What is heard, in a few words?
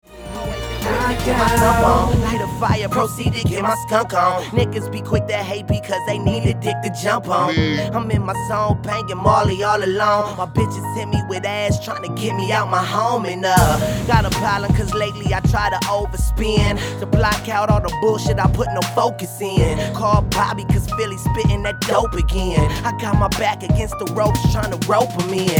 Music unmixed